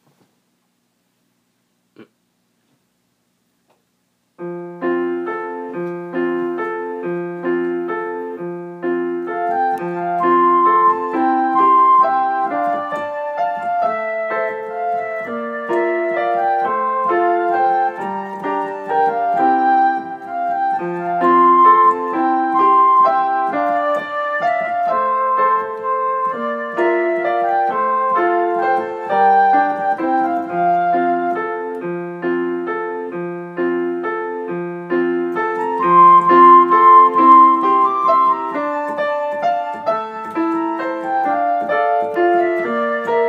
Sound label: piano; フルートっぽいかな？